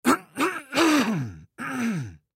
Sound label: Human Cough; Clear Throat Long Male Fifty Yrs; Human Clear Throat Long Male Fifty Year Old; Clearing Throat; Blastwave